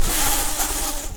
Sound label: bumblebees, Pascuorum queens